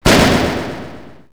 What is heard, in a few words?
Cyclo Bomber Bang